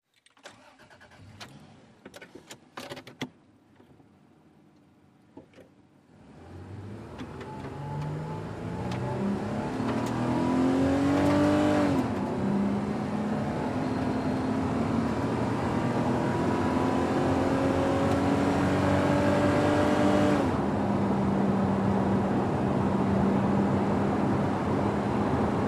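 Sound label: SUV Engine Accel 100mph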